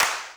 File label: CLAP, Dance Drums